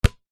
soccer ball slightly deflated single bounce slightly wet asphalt concrete; sport